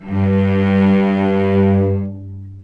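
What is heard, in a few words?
cellos attack G2 L, String; East West; The Ultimate String Collection; VCS ARCO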